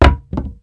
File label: wood; Mafie 1